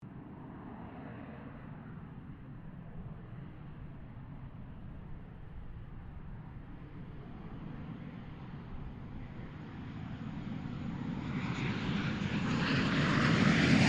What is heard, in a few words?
AIRCRAFT CA-27 SABRE TAKEOFF; CA-27/F-86 Sabre; Airplanes; Vehicles